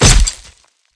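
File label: crowbar hit body; valve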